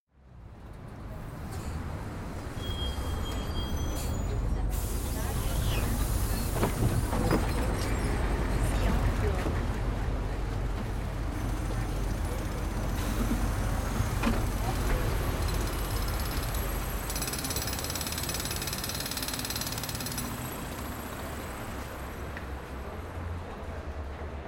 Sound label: The Number 7 Bus Along Rákóczi Street